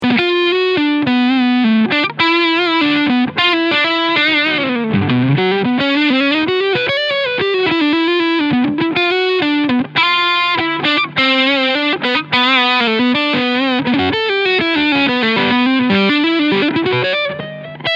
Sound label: Chapman Guitars ML3 Pro Overdrive Neck PU; Trad Gold Metallic